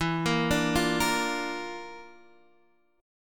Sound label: Bb Major E; Bb/E arpeggiated; JGuitar